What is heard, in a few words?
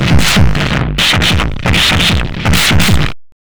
MD 909 150, Hard & Loud Techno; USB Soundscan vol.01; AKAI